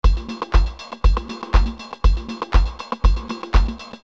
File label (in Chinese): 片头音乐